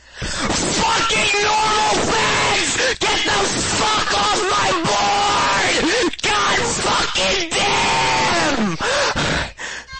rage; 4chan

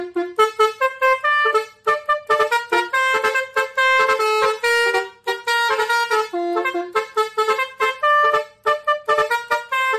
basuri bus

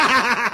laugh; lego batman

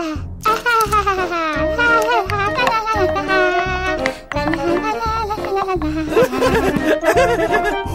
flaky singing and nutty laughing

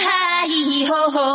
haha heehee hoho

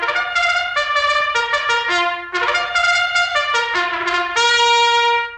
army bugle first call

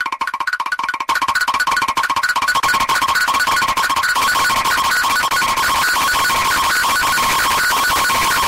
walking; mr krabs

